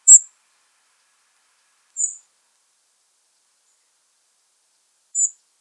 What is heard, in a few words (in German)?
Rotkehlchen Alarm